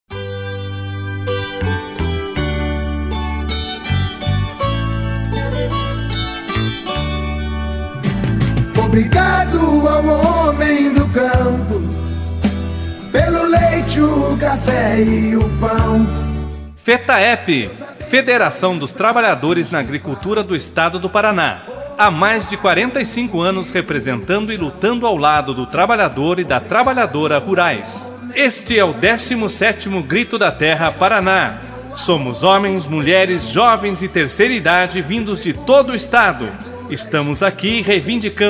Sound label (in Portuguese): vinheta